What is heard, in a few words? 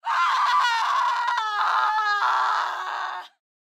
woman injured scream